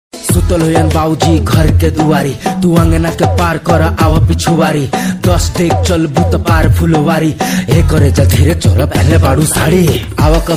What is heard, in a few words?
Rap